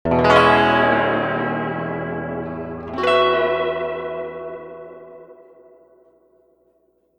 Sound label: reverb tank 3 spring medium tremolo chords